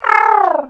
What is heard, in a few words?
damage; acidus